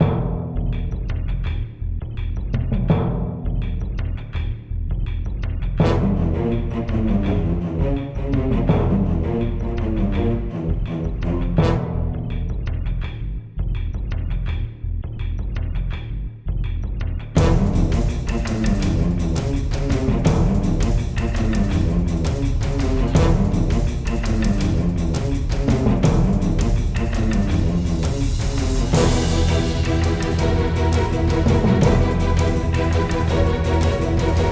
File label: stock music